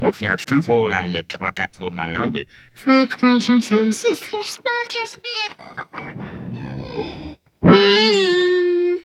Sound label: thunder rumbling in a